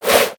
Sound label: Spear Swing